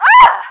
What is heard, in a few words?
pain; sydney